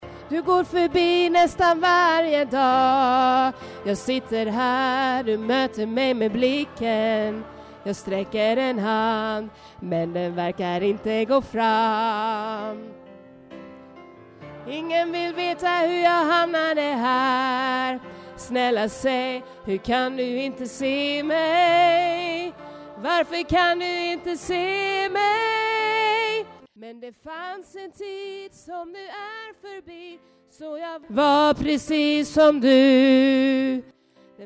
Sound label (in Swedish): så som i himlen alt, stammor